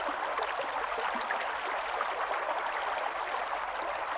river, Amb; RYL 2 v1673; Private RYL

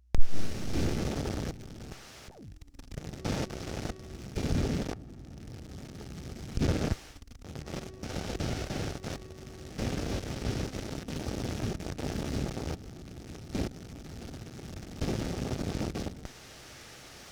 AM Radio Static; Electronics; Sony Pictures Sound Effects Series Volumes 1-10; Sony Creative Software

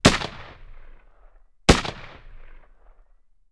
Machine Gun Shots; Weapons & Explosions; Sony Pictures Sound Effects Series Volumes 1-10; Sony Creative Software

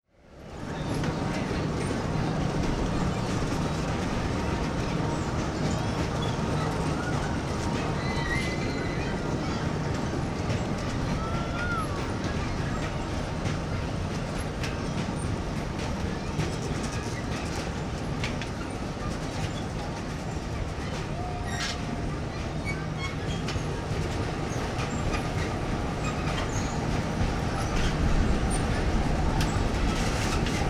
Fairgrounds, Backgrounds; Sony Pictures Sound Effects Series Volumes 1-10; Sony Creative Software

